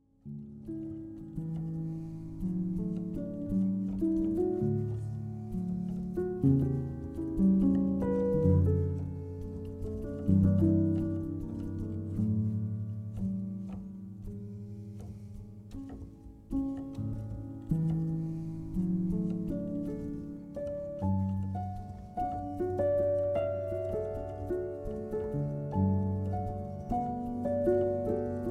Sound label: piano diary